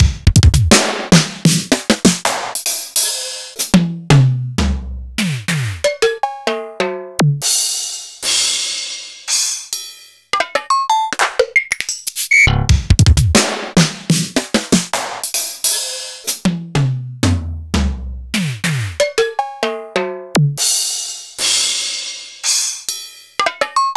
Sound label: Cheetah MD16